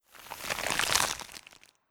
Golem Ice

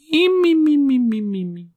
snore end

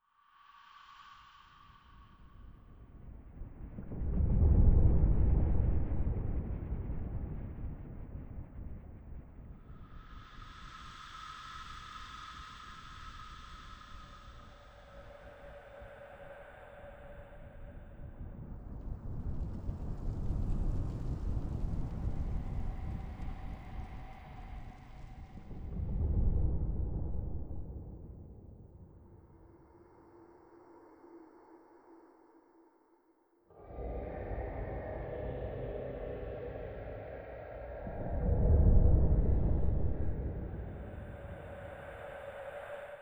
Cave Noises; gamerip

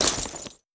Skell Soldier Cloth

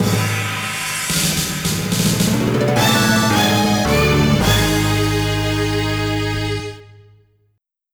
Gravestone (Game Over); metal slug 2; neo geo cd

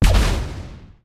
Mech Short Boost; starbites

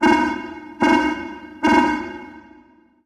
Radiation Hazard; Hanako

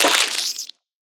Flycaller Death; Folk Hero

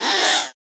Damage

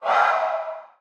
Ghost Attack

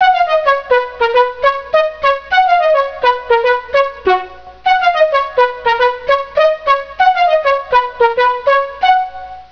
Blocks Synth Jingle

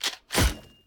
Medieval Tower; rabbids multiverse; iOS, macOS, Mobile

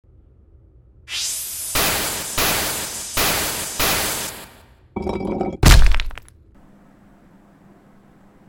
Laser plane; The Henry Stickmin Collection

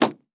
Pigeons

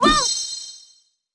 Medica F Cast

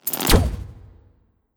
Ballista Launch

